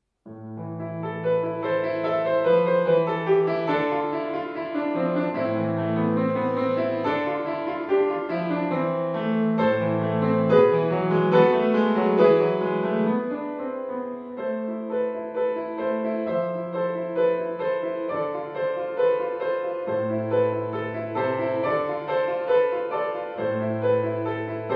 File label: A Pop Melody